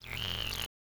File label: GLITCH SCI FI COMPUTER; MatiasMacSD